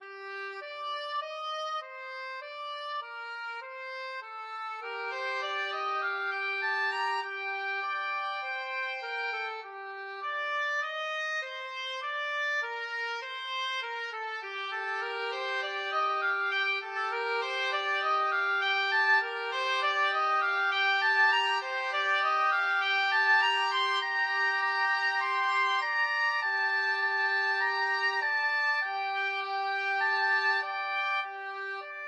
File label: oboe duet